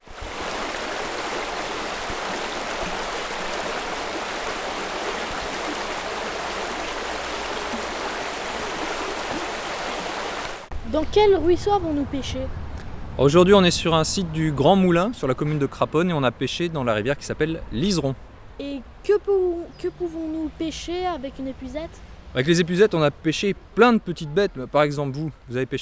peche ruisseau

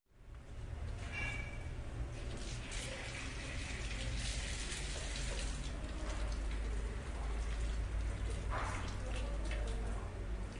Cantine vide

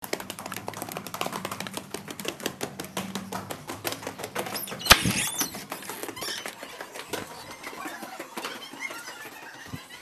claquettes sonores

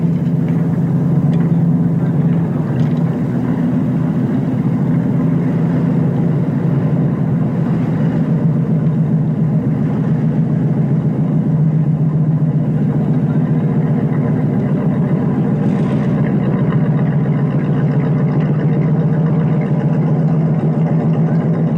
les péniches

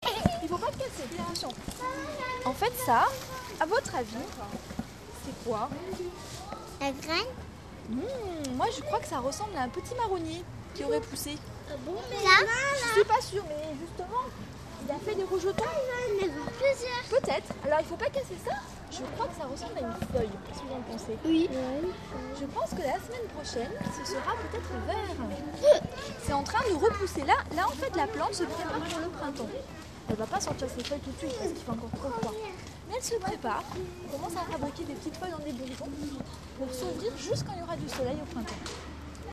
les petits jardiniers

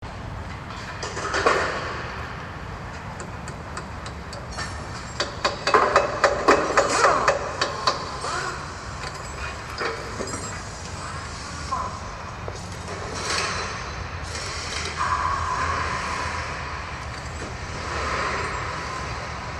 le garage

